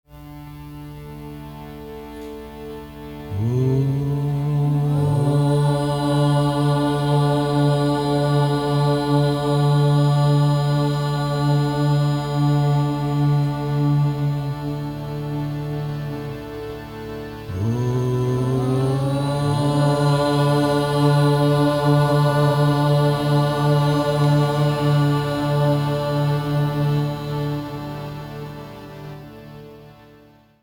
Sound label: om gayatri mantra